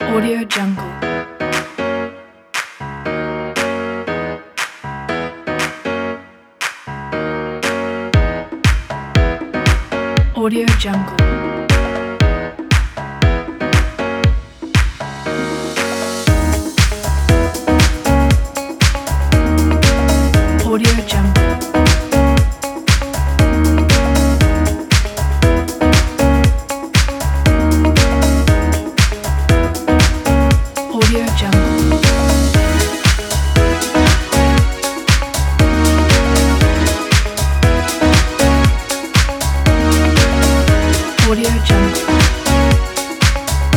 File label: fashion summer disco